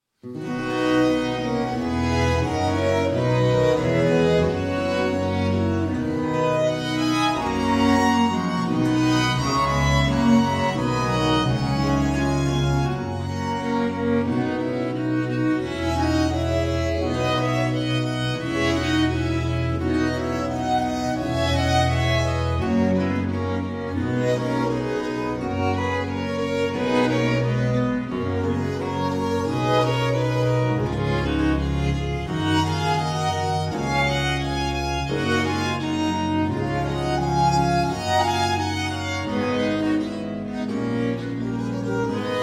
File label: sonata in C major; Sonata a6 in C Major